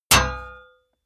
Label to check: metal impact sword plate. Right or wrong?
Right